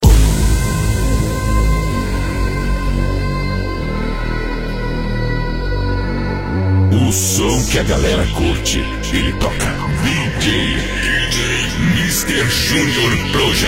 Batida Dance

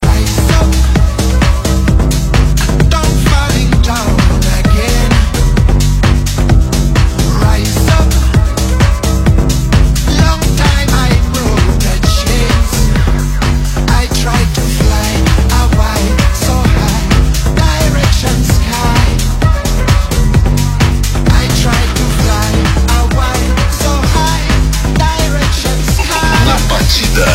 Batida Dance

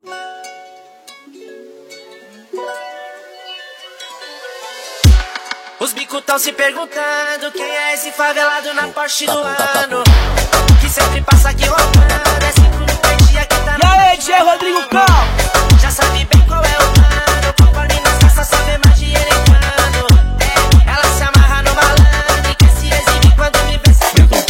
Forro e Piseiro

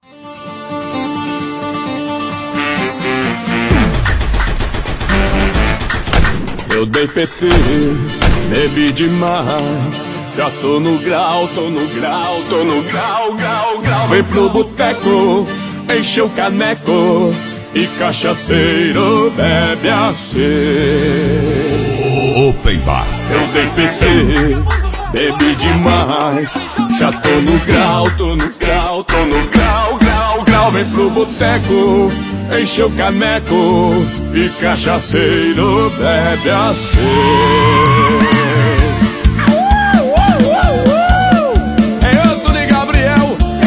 Open Bar Sertanejo

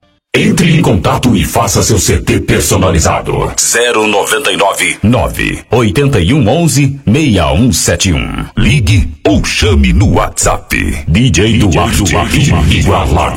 Raggae

